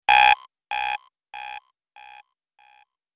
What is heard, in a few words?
hoarse tone